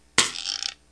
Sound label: drum, EMU Proteus; Proteus1